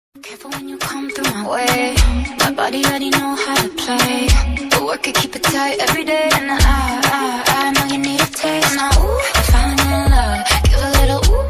English Song Ringtone

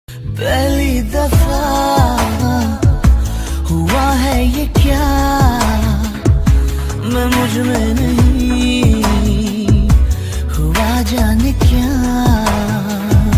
Old Love Song Ringtone

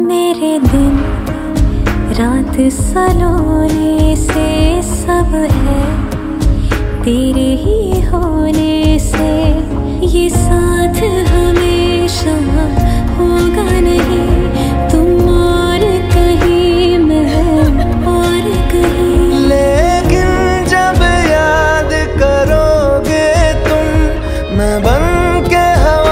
Sad Version Ringtone

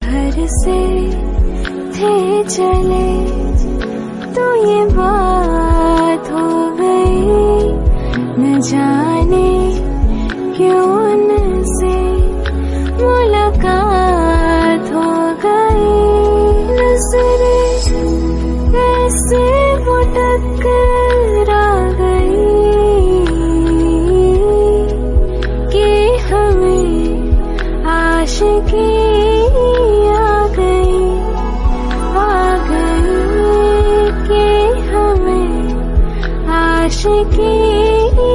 Female Version; rintone

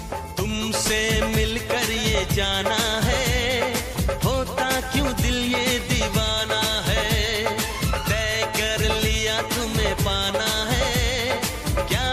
Old Hindi Song Ringtone

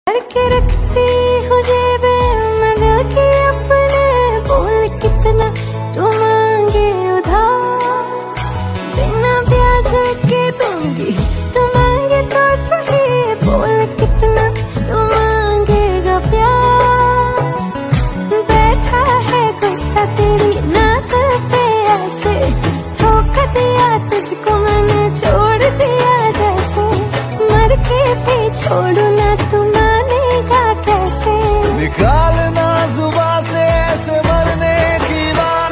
Love Song Ringtone

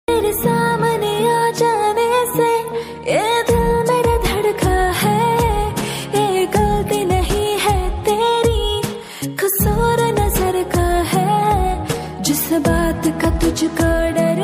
Romantic Female Song Ringtone

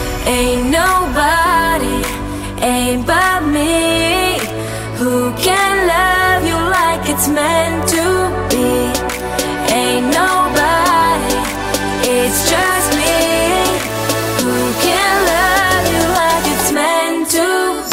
Hollywood Song Ringtone

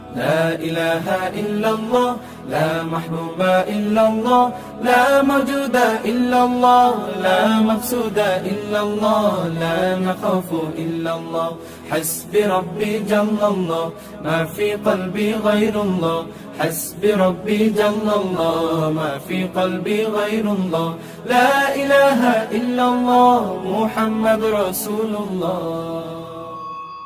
Hasbi Rabbi Islamic Ringtone

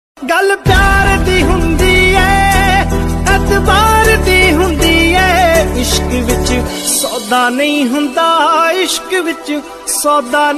Sad Punjabi Song; ringtones

